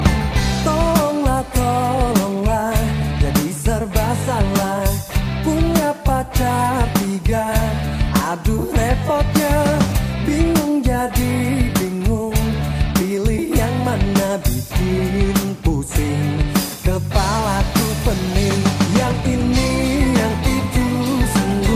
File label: Ringtone